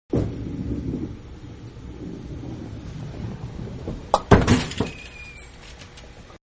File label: Bowling Spare one pin up close; Bowling (Sound Effects:Sports); Manhattan Production Music